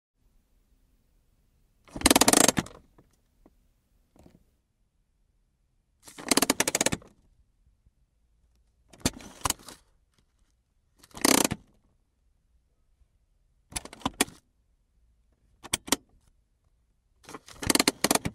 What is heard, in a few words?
Car handbrake